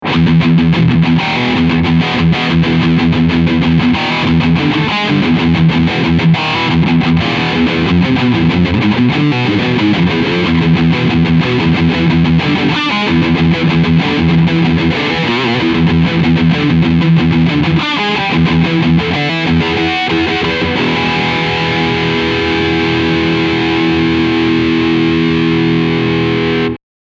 VT Full Gain

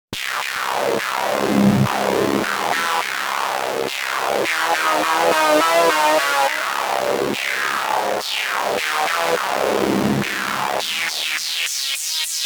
Sequential Pro 3; The Church Of SEM

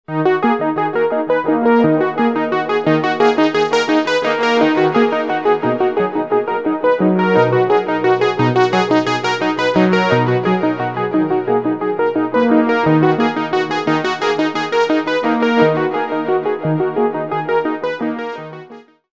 saw

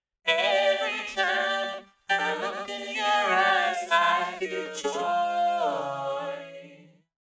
Vocals Space; Richard Devine

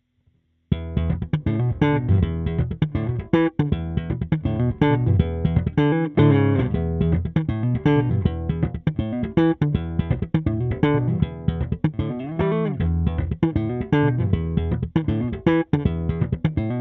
Block 300B EQ Bathtub Cab

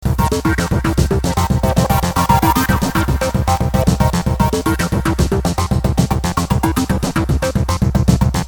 klinik BEAT

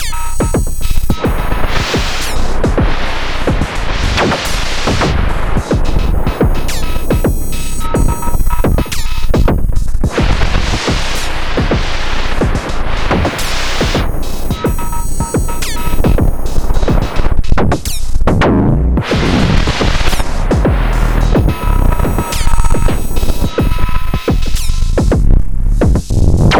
Noisier Beat; Erica Synths Perkons HD-01

